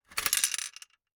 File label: Gumball machine, metal, glass, candy, switch, mechanism, rotate, dropping, quick; Eiravaein Works; Vaeyan IV